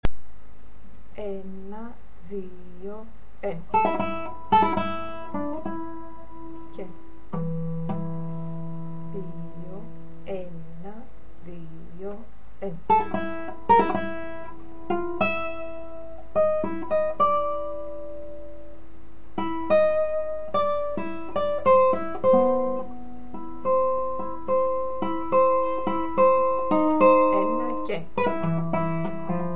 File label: tango